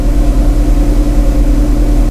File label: noise pure